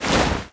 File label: body oneshot, foley